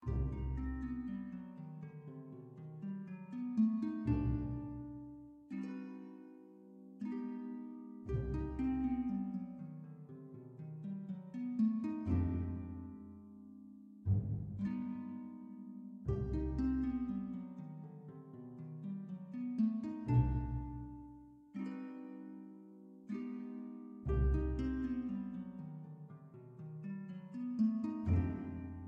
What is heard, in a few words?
Puzzle Layer; From the Shadows